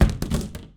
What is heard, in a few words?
Plastic, Collision Plastic; SIMUZENSOUND; TURISMO